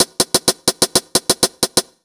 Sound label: 126 DRM, Disco House; USB Soundscan vol.20; Fresh Disco House I; AKAI; 126 BEAT